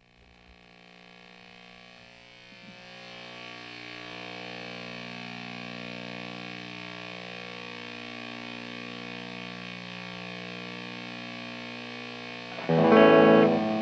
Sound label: 2 stroke hum